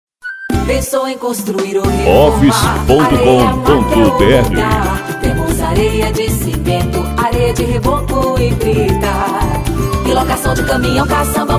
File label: samba; areia maq